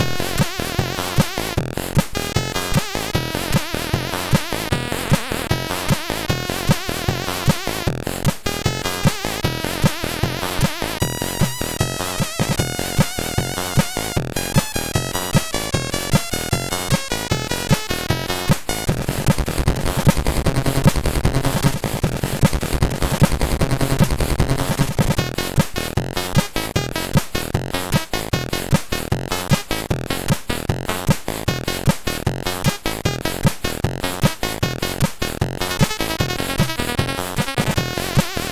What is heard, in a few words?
Blizzard part, MOS6581R3; Blizzard part 13